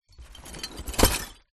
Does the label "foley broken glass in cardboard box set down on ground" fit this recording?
Yes